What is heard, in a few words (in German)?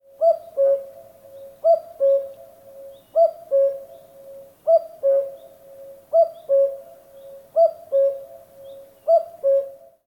nachtigall gesang vogelzwitschern kuckuck ruf